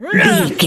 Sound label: voice female attack